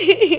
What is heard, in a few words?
laugh; DinningPhilosophers